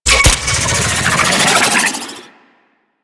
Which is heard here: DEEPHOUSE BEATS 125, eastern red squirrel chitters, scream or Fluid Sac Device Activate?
Fluid Sac Device Activate